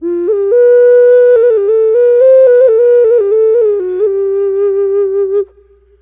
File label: Gourd Occarina